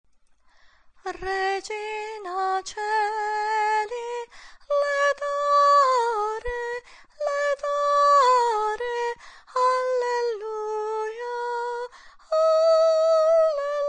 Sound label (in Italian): regina coeli soprani